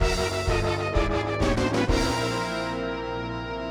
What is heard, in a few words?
Losing Jingle (intro only); mario tennis; n64